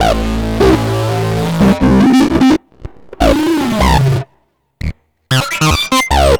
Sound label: Bass, Futurist Drum & Bass